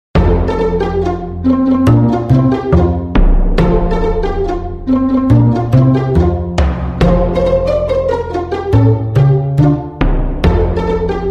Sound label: Happy Instrumental Music